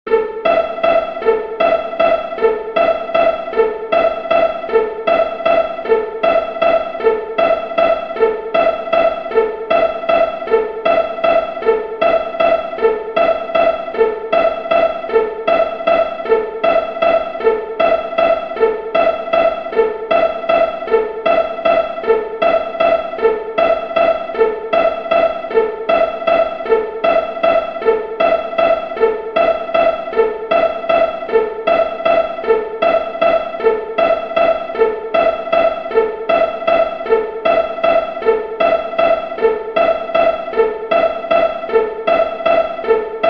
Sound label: Crosspicking 80bpm, mandolin